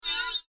Mouseover Bright Metallic Scrape; Multimedia; Blastwave

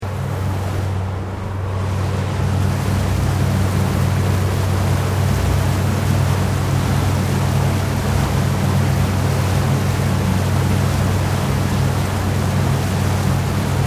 Boat Water Taxi Moving Stopping, Small water taxi; Boats; Vehicles

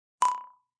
Clickie; Clicks; Multimedia

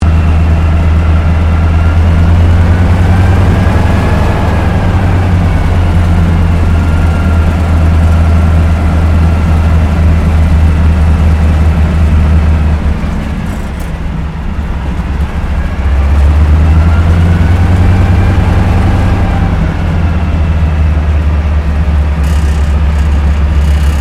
AIRCRAFT AT 6D HARVARD MK III TAXI INTERIOR; Temora Harvard MK III; Airplanes; Vehicles; Airplane Sound Effects

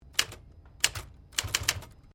Typewriter 1950s Sequence

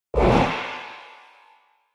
Metal Explosive Impact; Miscellaneous Impact Sound Effects

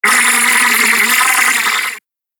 Alien Psycho Fly; Alien Sound Effects; Sci-Fi Sound Effect; Andrew Fleming

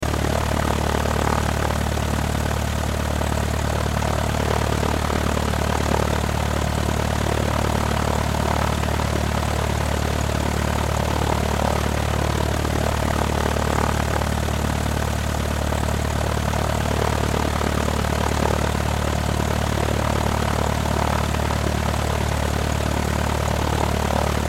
Plane Idle Propeller Loop; Transportation Aircraft; Airplanes

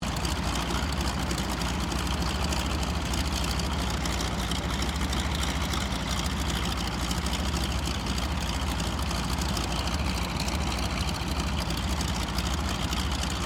AIRCRAFT CAC CA-25 WINJEEL IDLE; RAAF Winjeel fighter trainer; Airplanes; Vehicles

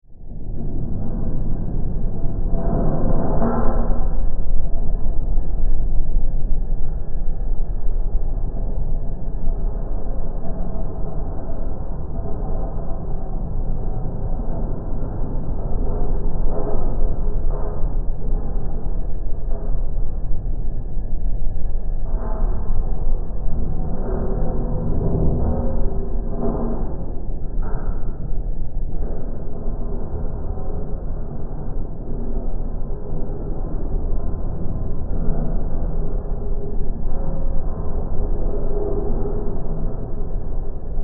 Ambience, Dark, Lair, Scifi; 5.1

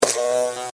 Electrical Arc; Miscellaneous Electronic; Electronics; BlastWave

